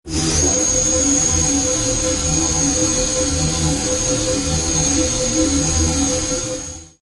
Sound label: Laser Beam Sci Fi Space Alert